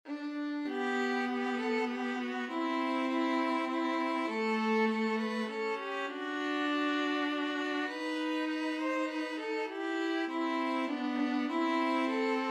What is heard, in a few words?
we wish, violin viola duet